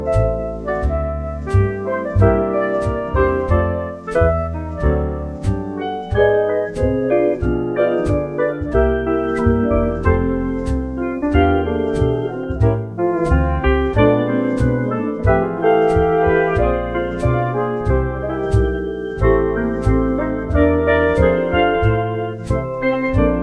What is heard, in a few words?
christmas jazz